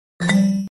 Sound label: New Blackberry Sms